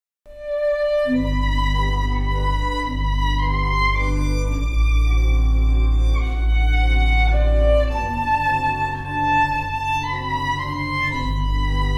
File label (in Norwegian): fiolin